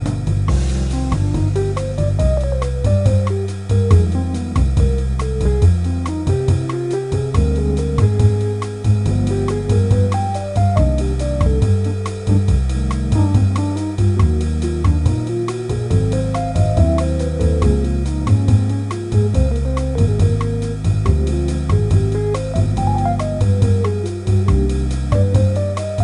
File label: jazz piano